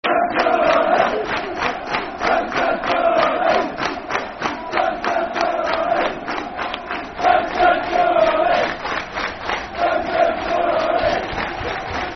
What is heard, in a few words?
Football Chant, Soccer Song; Ferguson's Blue & White Army; Peterborough United Pufc